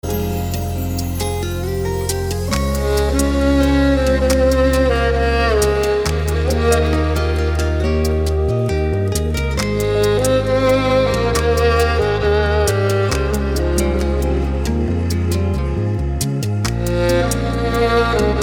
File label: Ring